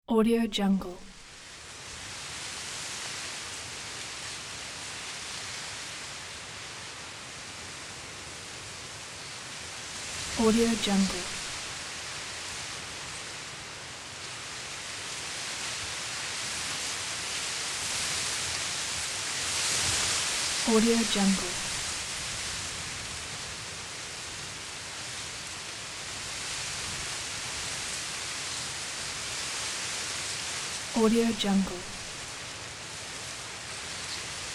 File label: wind in grass